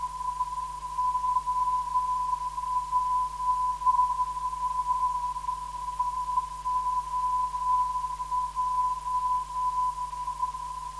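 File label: 403kHz, non-directional beacon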